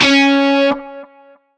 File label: Shawzin Oct Three C Sharp; Music; Warframe; Narmer Shawzin